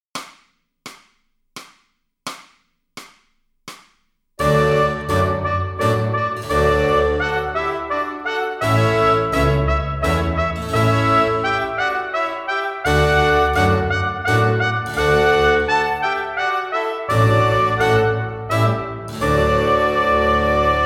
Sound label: Trumpet Menuett